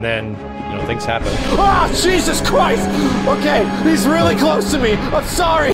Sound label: Aah